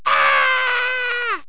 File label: scream